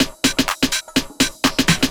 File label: HOUSE BEATS 125